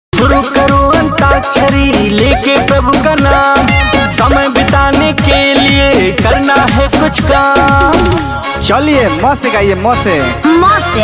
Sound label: vivah geet bhojpuri; Antakshari; funny YouTube short